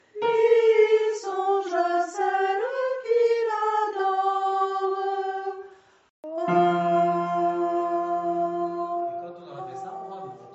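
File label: alto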